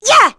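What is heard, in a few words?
Vox Attack, English; Chrisha; kings raid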